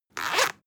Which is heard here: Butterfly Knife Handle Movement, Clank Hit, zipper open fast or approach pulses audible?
zipper open fast